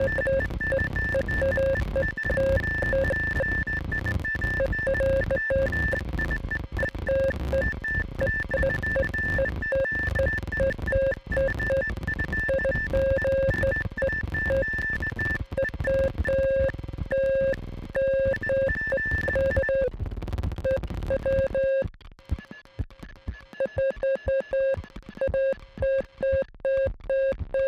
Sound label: Data Transmission